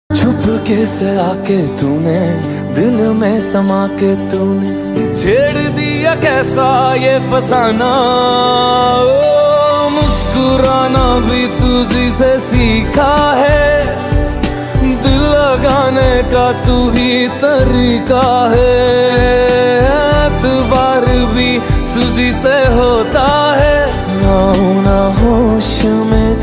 Hindi Love Song Ringtone; ringtones

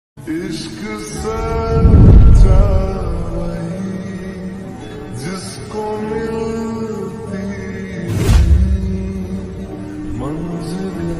Sad Ringtone

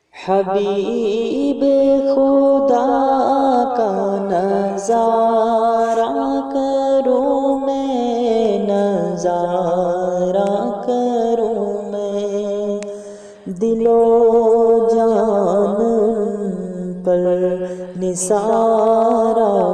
Naat Sharif Ringtone

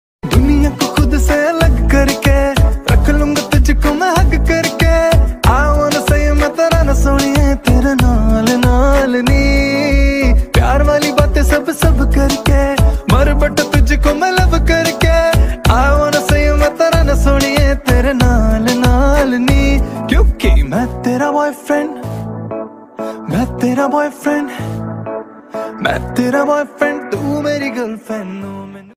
Love Ringtone

